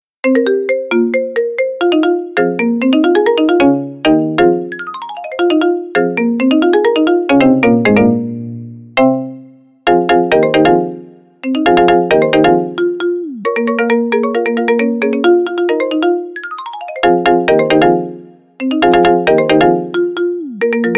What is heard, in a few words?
marimba ringtone; ドラゴンボール